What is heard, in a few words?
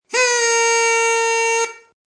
Party Horn, a party horn blasting; High quality recording of a party horn blasting; Party Horn Sound Effects; Games